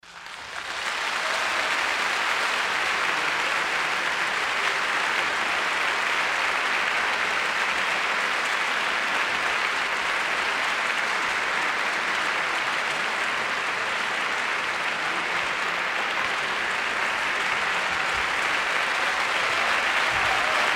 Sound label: Applause